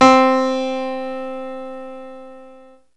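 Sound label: C3 bright piano; Drums; Proteus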